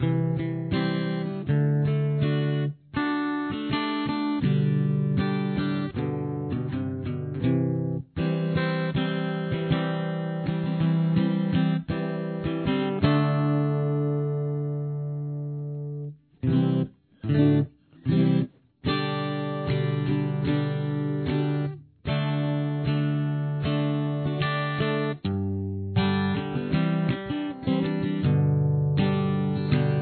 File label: chorus, guitar